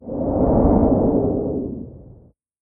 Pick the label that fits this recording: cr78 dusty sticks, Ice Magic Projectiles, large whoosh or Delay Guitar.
large whoosh